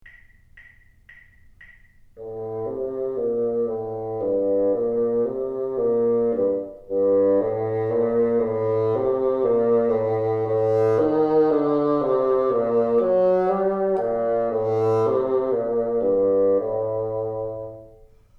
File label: teacher, bassoon; Music and the Bassoon